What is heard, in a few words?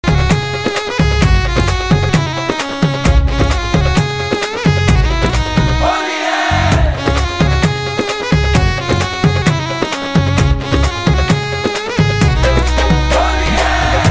instrumental